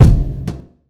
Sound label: low tone kick drum sample g key; Duration 0:01; Samplerate 44100 Hz; kick drum samples